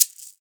SHKR LEGG HI, Synclavier Percussion; Synclavier Percussion 2; World & Orchestral; ILIO

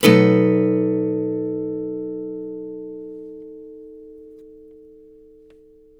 CHORDS, Blues Guitar; ILIO; Fretworks

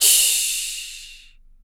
CYMBAL, MALE PERC

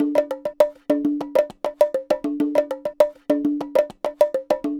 Ensemble, Latin Percussion

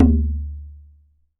DARABUKA; WorldSounds

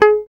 GUI MUTE, E-GUI MUTED; Sound & Vision; Gigapack I CD 2

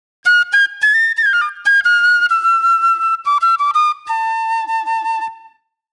Shrill Flute; Sonic Foundry (Sony Creative Software); Manhattan Flutes

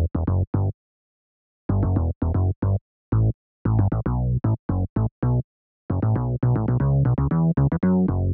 Bass, Am 115bpm; Zero-G; Nu Disco; Construction Kits

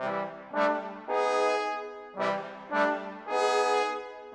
Brass; Sonic Foundry (Sony Creative Software); Orchestral VOL-2

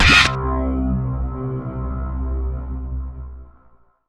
DD SYN, Disco House

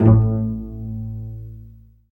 Vcs Pz, String Sections; Roland LCDP13

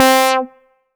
lead C4, EMS VCS3; Polyend; Simulacrum Sounds; 1 Shots; Synths; Leads and Keys

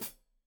Hat Foot, Hi-Hat, Cymbals Stereo; Deeply Sampled Drums

